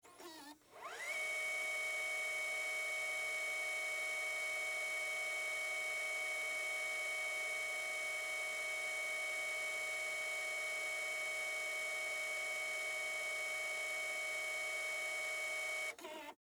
HD Camera Forward, Hd, Camera, Fast, Forward, Cassette, Tape, Sony, Hvr-Z5